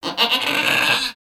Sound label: Pets Goat Angry; creatures; Lego